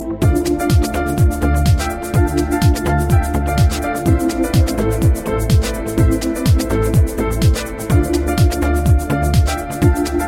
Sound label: Electro